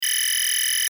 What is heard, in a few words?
DIGITAL STREAM; Multimedia; Sound Design; Blastwave